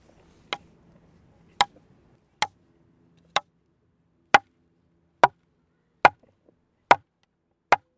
schlagen auf holz

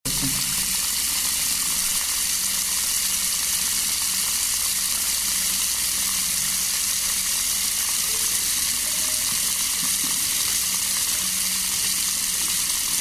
wasserhahn

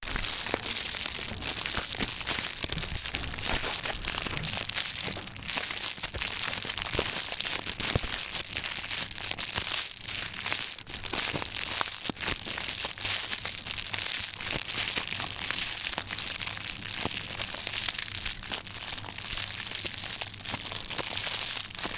feuer

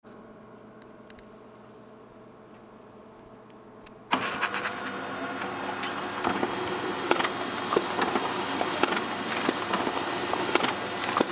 drucker

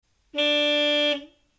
bus hupe

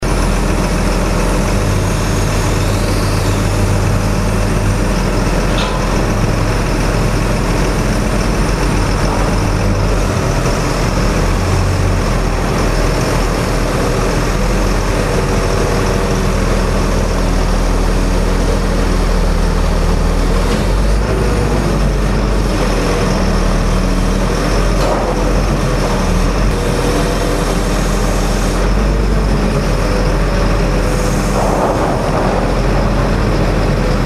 baustelle

